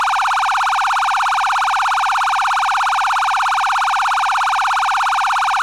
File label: Federal Signal PA640 Air Horn